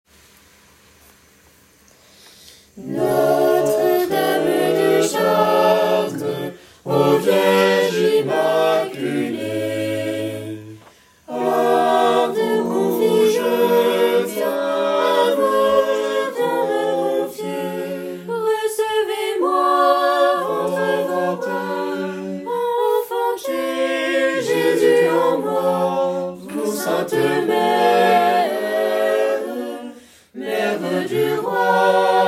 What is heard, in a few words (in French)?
Chant du Jubile Refrain Tutti; sanctuaire